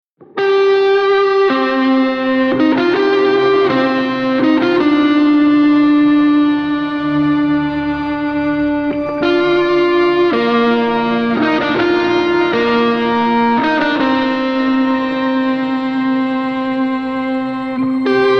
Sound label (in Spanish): Guitarra Aislada BPM 167